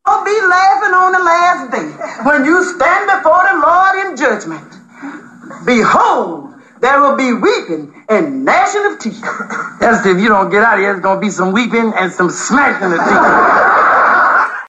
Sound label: Wheeping Gnashing